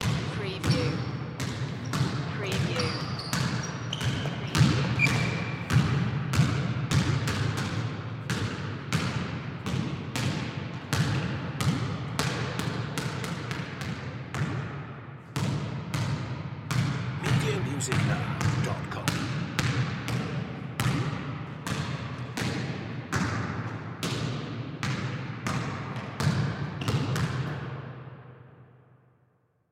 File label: Basketball Game Two; sound effects bible